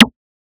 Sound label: snare; 黄金拼图